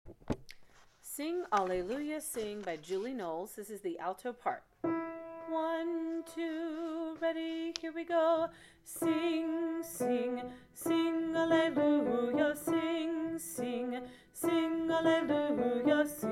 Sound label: sing alleluia sing alto